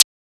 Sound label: Closed Hat; FLPRO